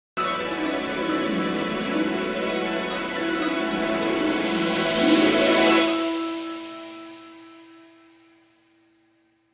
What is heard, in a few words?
Dramedy Sting, Short